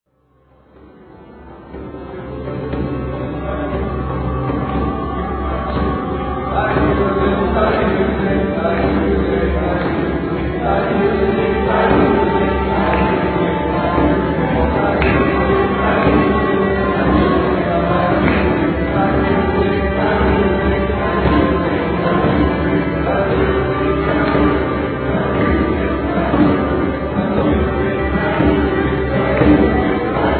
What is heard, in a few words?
music, qawwali